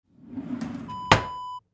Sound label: drawer kitchen close